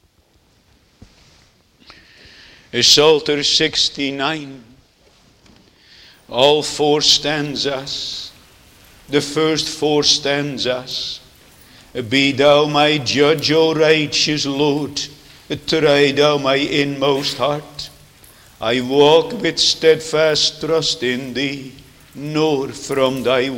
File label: Singing